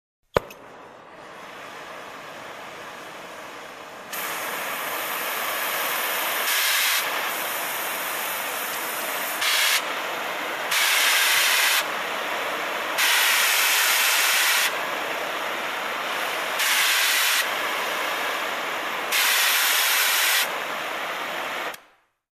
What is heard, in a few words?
Paint Sprayer Machine in a Construction Site